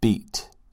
beat

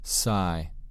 sigh

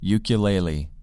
ukulele

